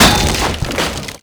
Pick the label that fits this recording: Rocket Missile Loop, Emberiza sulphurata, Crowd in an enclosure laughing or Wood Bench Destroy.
Wood Bench Destroy